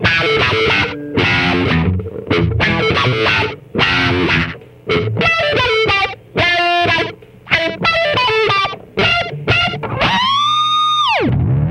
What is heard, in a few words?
Guitar Riff Wah